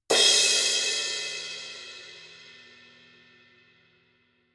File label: formula 602 classic sounds 19 thin crash edge; paiste